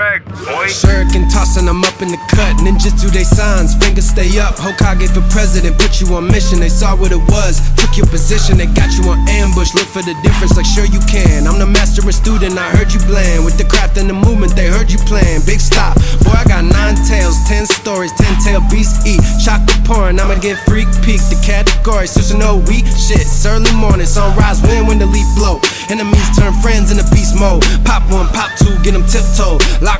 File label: RAP RINGTONE; NARUTO